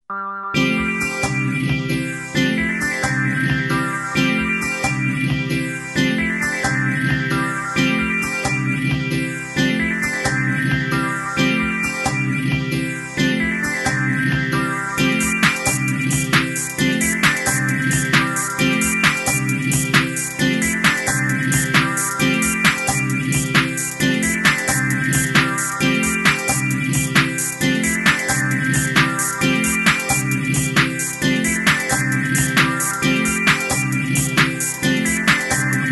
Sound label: Bell; Frogman Records